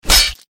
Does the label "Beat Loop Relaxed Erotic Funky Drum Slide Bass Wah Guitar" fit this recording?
No